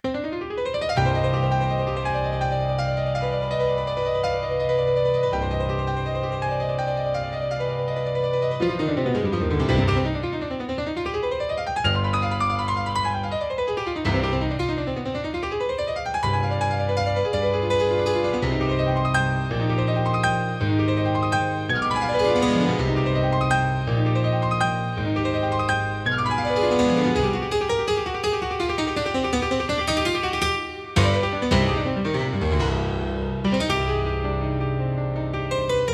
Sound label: Piano